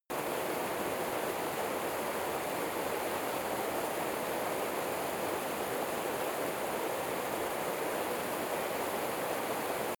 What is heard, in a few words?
river stream distant loop